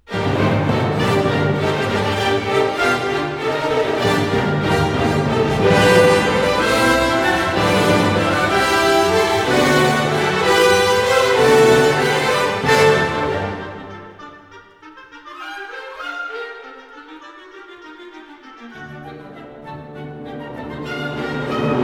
Enigma Variations, for orchestra, Allegro di molto; Elgar